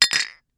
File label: Glass beer bottles knock together